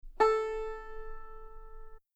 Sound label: banjo a4